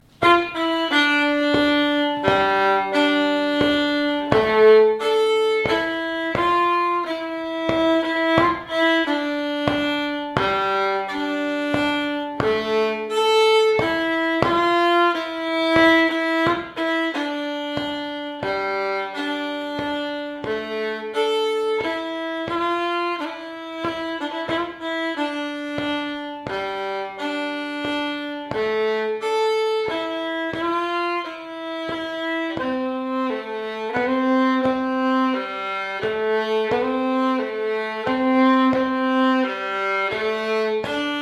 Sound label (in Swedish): Slängpolska Basstämma; efter Karl Kollberg; Skepparkroken i nordvästra Skåne